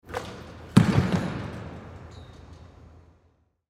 Basketball Slam Dunk Rim Rattle; SPORTS; Blastwave

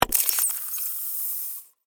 Device Release, Pressure, Pop, Open; Science, Fiction; Tech Sound Effects

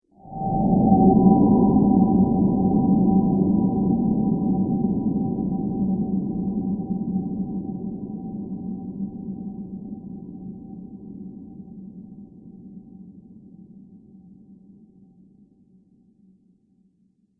Dimensions Underwater Future, Sci Fi Music

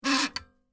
Safe Door Open, Small; Miscellaneous Doors

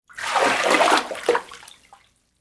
Water Pour Into Tub

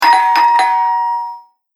Old Doorbell Double Press; Foley; Andrew Fleming

